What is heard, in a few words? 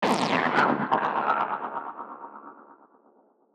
Shiver; SFX; Ambient - General